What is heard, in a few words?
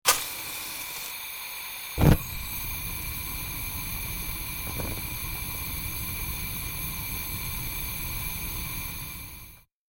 Lighting Stove, Lighting a gas stove with a match.; Flames; Objects